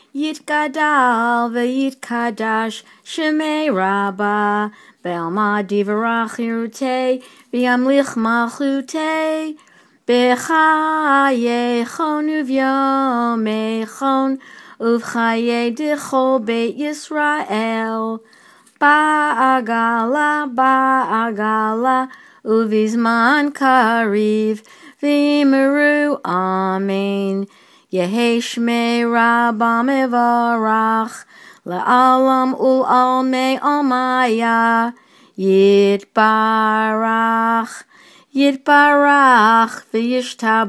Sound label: chatzi kaddish